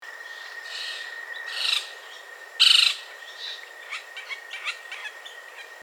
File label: red crowned woodpecker